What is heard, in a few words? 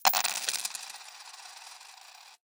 coins; sonniss gdc